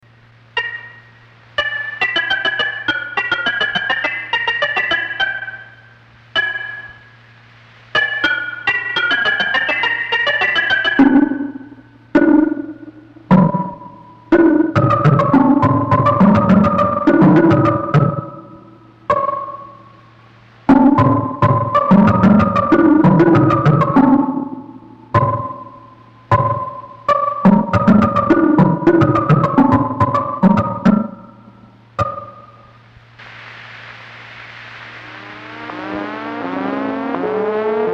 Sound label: Buchla Box Music; Warners